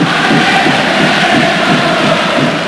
Chant, Crowd; David Beckham Soccer; XBOX

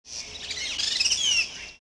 bird trill, colombia; ambience; Soldier Of Fortune II Double Helix; XBOX

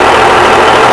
diesel; WhiteOut; XBOX; trojan

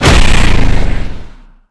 launch; Quantum Redshift

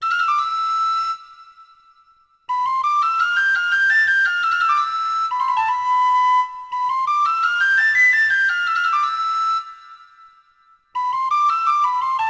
township wright; Sid Meiers Pirates; XBOX

